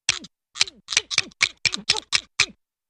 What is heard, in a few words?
Funny Teeth Clicks, dog